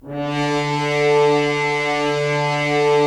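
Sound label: Solo French Horn, brass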